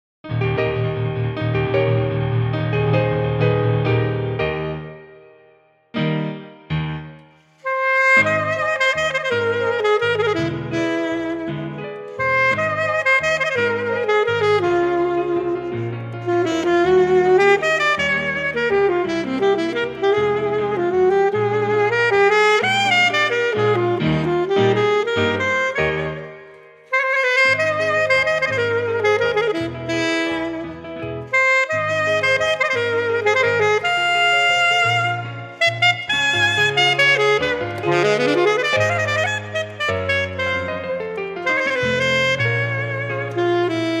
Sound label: Sax